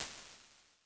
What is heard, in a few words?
chamber big, IR samples; Convolution; NI Kontakt 5